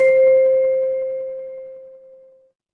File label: instrument chime bar